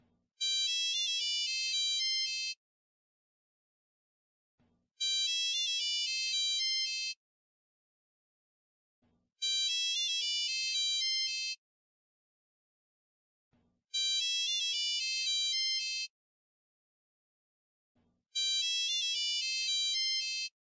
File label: Phone; Jurassic park